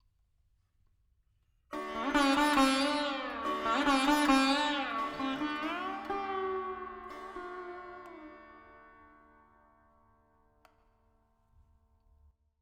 Veena D 70 Verb; Sample Banks; Melody; 4-4

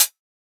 HiHat, Percussion; Sample Banks

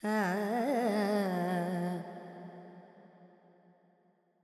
FX Lydian, Vocals, Carnatic Female, Alaps; 70 G; Sample Banks

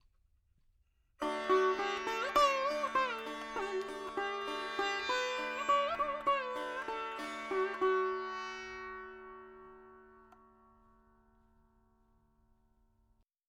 4 4 D 100 Dry, Melody Veena; Sample Banks

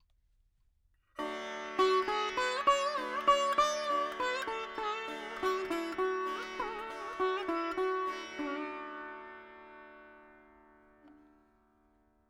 4 4 D 100 Dry, Melody Veena; Sample Banks

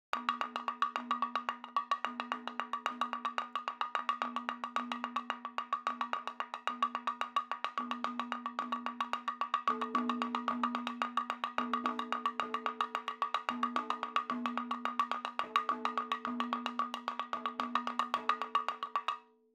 110 7 8 MADALAM, percussion; Sample Banks; MADHALAM; MADALAM 7 8 FILES

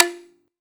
Perc; Sample Banks; Percussion

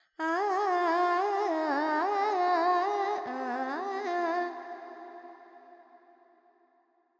Pentatonic, Vocals, Carnatic Female, Alaps, G

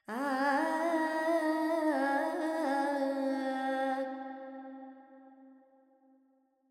Verb Charukesi, Carnatic Female Alaps; Sample Banks

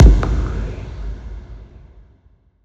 FX Impact; Sample Banks; Percussion

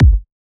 Kick, Percussion; Sample Banks

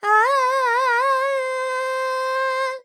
Charukesi, Vocals, Carnatic Female, Alaps, G, Dry

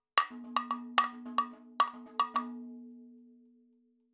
110 7 8 MADALAM FILLS, percussion

